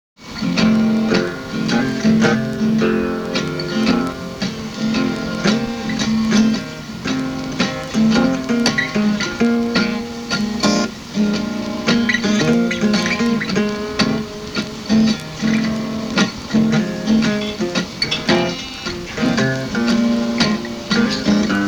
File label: Blues